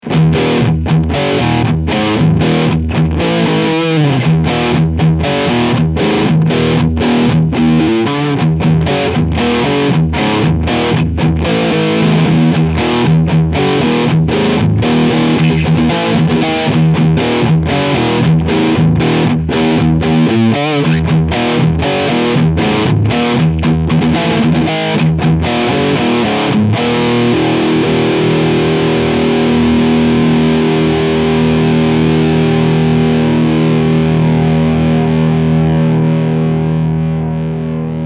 Harley Benton Extreme 76 BK Riff Sound Steg Humbucker